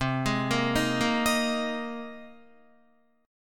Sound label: C Minor 7th Sharp 5th C Guitar